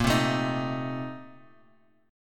A sharp Major 7th Suspended 2nd Suspended 4th A sharp Guitar Open G